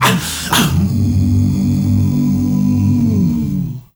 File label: male hum, Heart of Africa; Spectrasonics